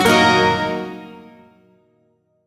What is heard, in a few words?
Shawzin Blues Chord G; Music; Warframe; Duviri; Chords